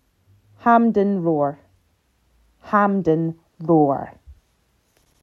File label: Roar; Hampden